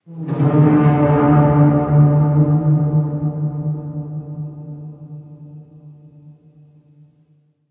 Metal Distant Groan; Silent Hill Homecoming

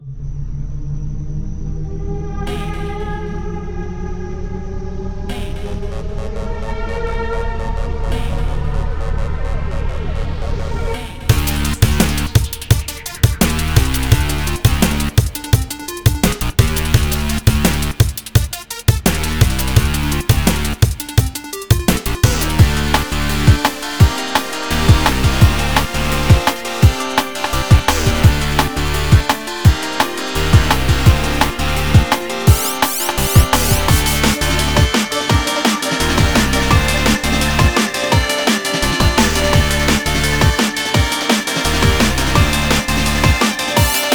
Instrumental; Friday Night Funkin' Mind Games OST PC mod gamerip

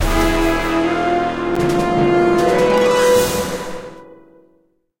Chase Stinger

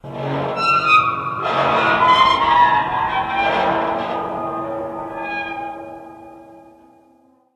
Metal Screech; silent hill homecoming 2008 gamerip pc ps3 x360

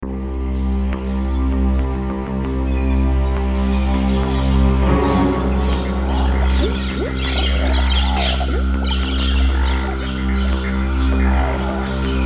Swamp Base Peace Tense; Serious Sam 2; Magnor

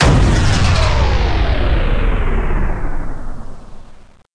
Explode; Learn to Fly 3

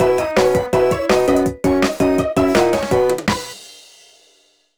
Level End; Moshi Monsters Moshlings Theme Park; NDS

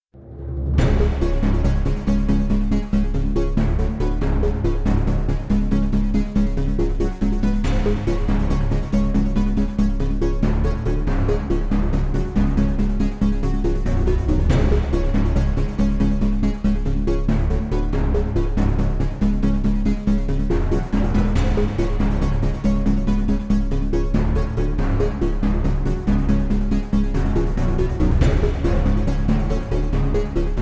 Starport Tension; Mass Effect 2

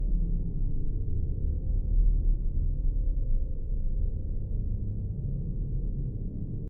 Ambience ancient temple

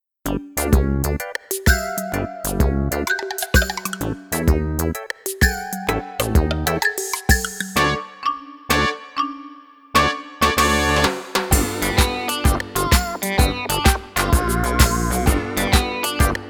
Comical Music; Kidou Shinsengumi Moeyo Ken

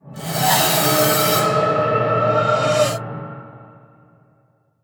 Combat Mix Samples

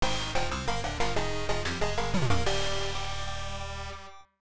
Disapear, Game Over; steam heart s; pc 98

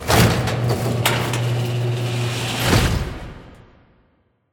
vent door open reverb

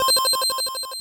1-Up; final mission 1990 nes gamerip; Mono~Stereo

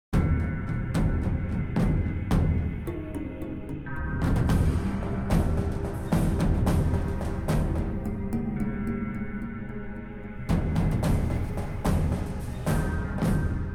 Fight Theme Tension Fast Start; ryse son of rome 2014

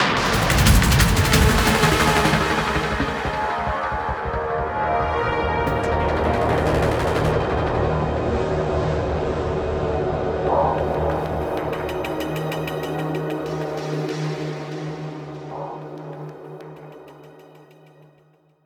Stinger Chase

